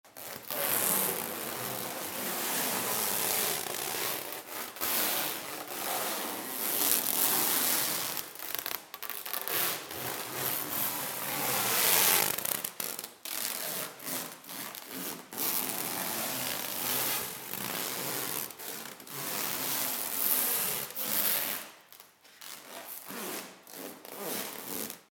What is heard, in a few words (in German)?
Ware auf Palette Strechfolie